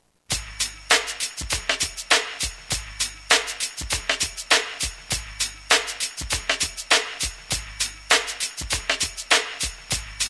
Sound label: Lo-Fi 100; Roland M-DC1